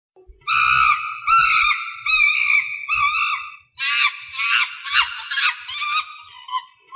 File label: monkey sms tone